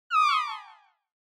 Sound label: Negative High Short; Onoma; オノマトペ ネガティブ; 高 短